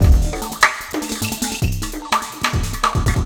Break Beat, Drum N Bass

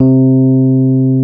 electric pianos, C 2; Wizoo

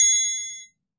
TRIANGLE, PERCUSSION; AKAI S6000 CD-ROM - Volume 5; GM PERCUSSION KIT 1

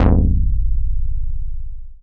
Dance Floor Bass, Multisample Basses

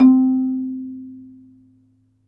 MARIMBA B; Synclavier Percussion; World & Orchestral; ILIO

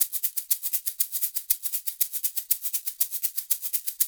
LOOP, SHAKERS

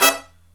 HI CHORD, Brass

